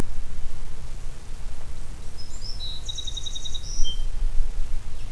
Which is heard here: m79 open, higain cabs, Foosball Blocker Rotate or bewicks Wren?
bewicks Wren